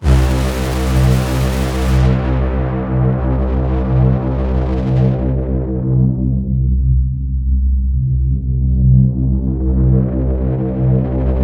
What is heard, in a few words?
C1, Synth Line; 90 s Sample CDs; NiceBeats; SynthLine VOL-16